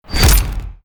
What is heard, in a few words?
Menu Install Mod Func; Custom UI Sounds; Call of Duty Black Ops Cold War